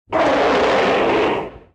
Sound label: Gomess roar